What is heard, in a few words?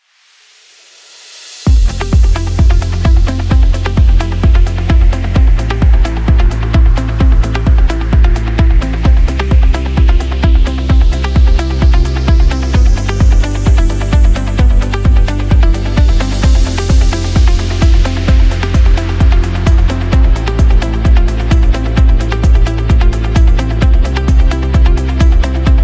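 House Tour, motivational corporate music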